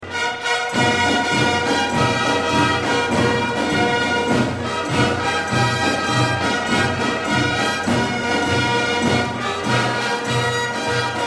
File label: Marching Carnaval